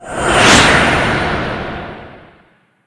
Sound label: divine storm